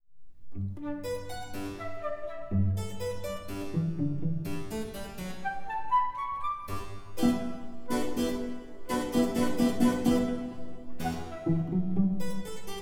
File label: Sonata en Trio; Holdeman